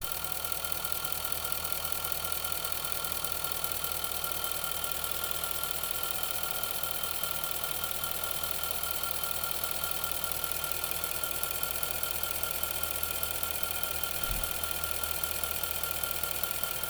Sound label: sewing machine under hood; xk xkr x150